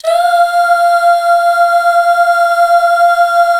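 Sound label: Duh Female; Best Service Gigapack 1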